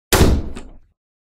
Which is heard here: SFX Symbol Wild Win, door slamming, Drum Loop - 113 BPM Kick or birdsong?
door slamming